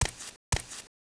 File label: Walk